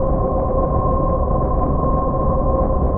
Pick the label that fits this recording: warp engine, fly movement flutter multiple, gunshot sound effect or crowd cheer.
warp engine